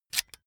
electric drill template adjust heavy in; soundpool

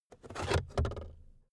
car backseat table pull out cupholder heavy

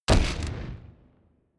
flutter echo; whooshes rises; hits impacts

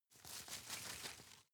shake soft; berufe; foerster

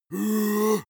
monster growl attack deep; kreaturen

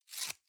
box small tear apart soft

